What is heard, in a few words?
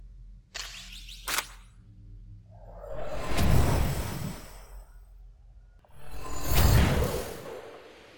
telepad usage; HLD